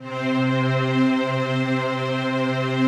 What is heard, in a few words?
PAD, DIGI-PAD